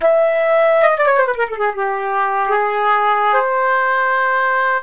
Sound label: flute